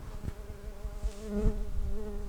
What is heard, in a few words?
Pascuorum workers, bumblebees